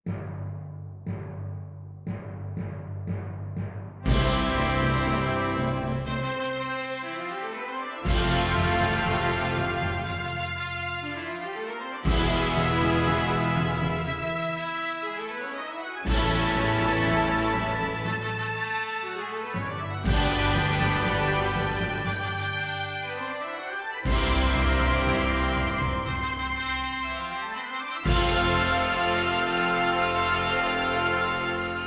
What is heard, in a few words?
Intermediate Daily Warm up C Horns in Fifths